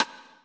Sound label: REV SID; Greytsounds; Scott Peers Sound Engineering